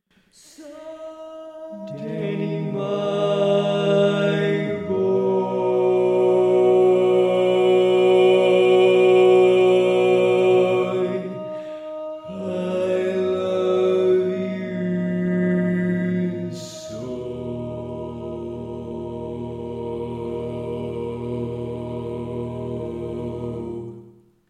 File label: Bari, barbershop tags